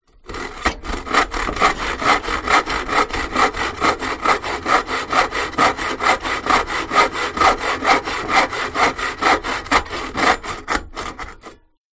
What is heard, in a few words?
Hacksaw